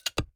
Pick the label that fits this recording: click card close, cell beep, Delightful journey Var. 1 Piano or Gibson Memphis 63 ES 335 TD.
click card close